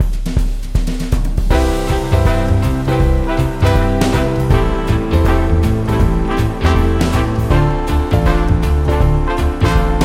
Smooth Jazz

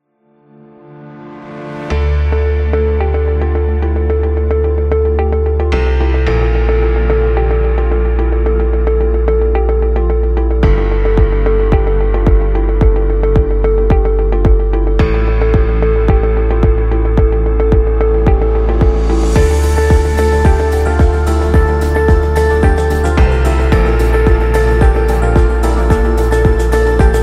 Dramatic News

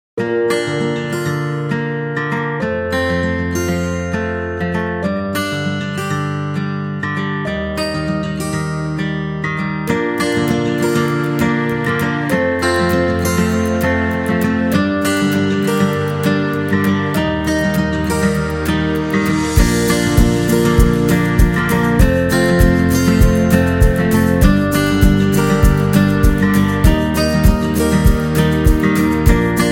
Guitar; happy music vol 8

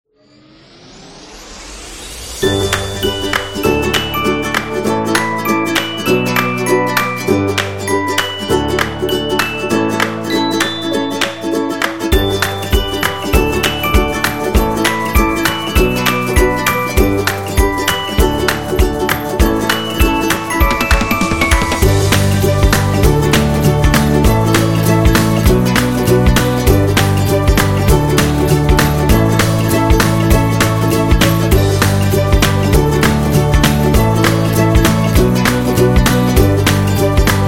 Folk for Kids, happy music

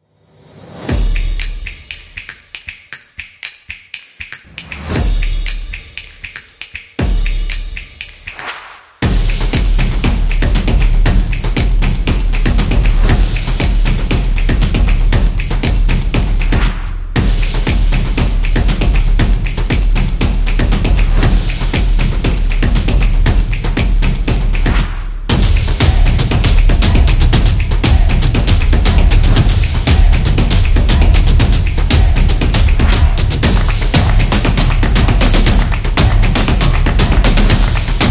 The Drums Typography; football promo

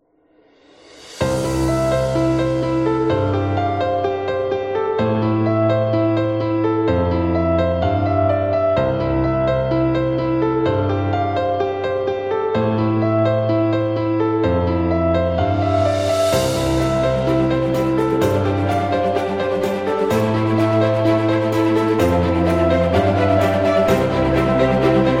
Cinematic Inspiration; corporate music vol 4

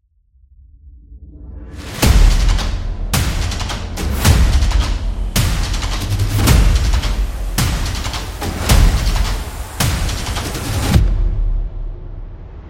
War Drums

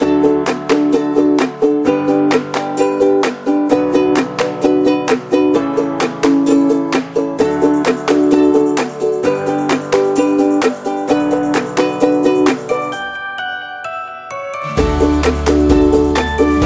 Intro Happy; birthday music

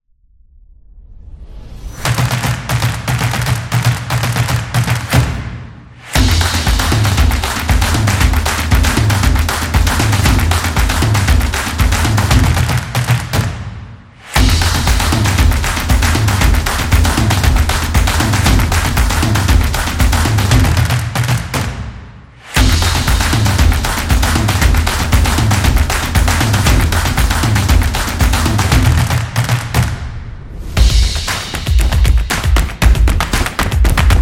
The Drum Fun; football drums

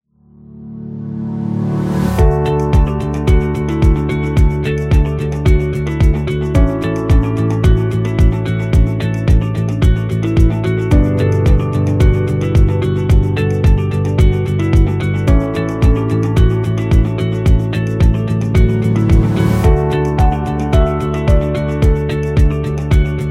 Gentle Corporate, corporate music; corporate music vol 11

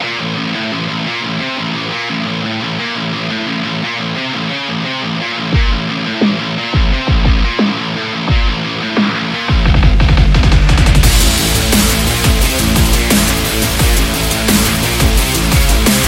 Metal Epic

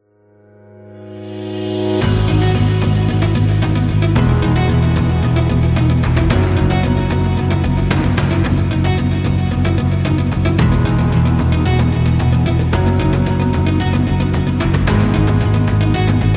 Motivation

